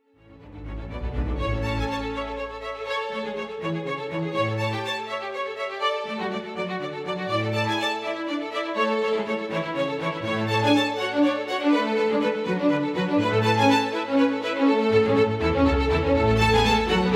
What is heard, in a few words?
PARTITA SYNCRON Excerpt; VSL